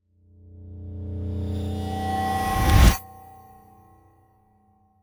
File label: 321 Tone rise